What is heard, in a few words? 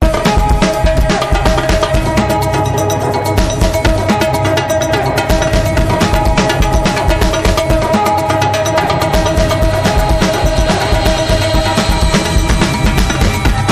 Cuica Brazilica